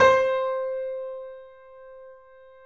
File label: 3D Pianos, Yamaha C7; E-MU Producer Series Vol. 5